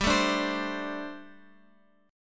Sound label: G Suspended 2nd Suspended 4th G, down, Guitar, Open F; Gsus2sus4; strummed